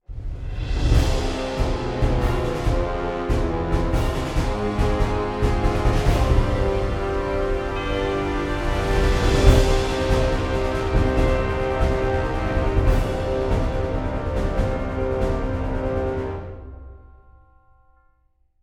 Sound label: Music Ending Chase Introduction